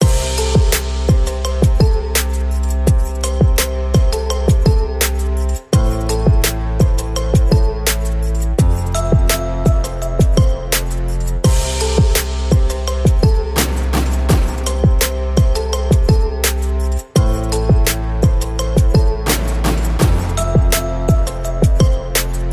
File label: remix ringtone